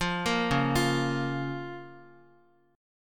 Db 6th, Guitar; Db6 arpeggiated; JGuitar

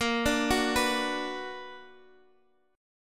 B Minor Major 7th Bb, chord

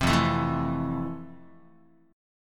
F Major 7th F, Guitar; strummed; JGuitar

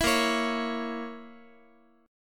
A sharp Diminished A sharp, Guitar; strummed; 4A,4E,5A,5D,5G#,6C#; JGuitar

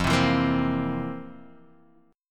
C 7th F, C7/F; strummed; JGuitar

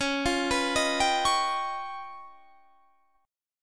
Db 7th Sharp 11th Db; Db7#11 arpeggiated

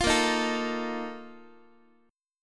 A sharp Major 7th Suspended 4th, Guitar; strummed

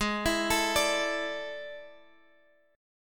A Major 7th Suspended 4th G sharp

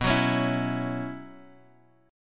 B Suspended 2nd Suspended 4th, Bass Standard; strummed; JGuitar

